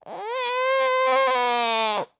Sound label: begin shivering